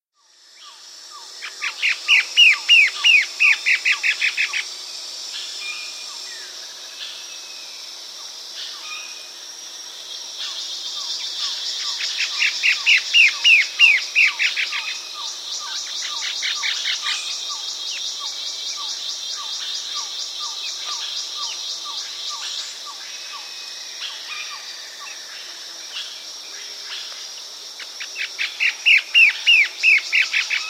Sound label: White-Flanked Antwren, S. America, Natures Songs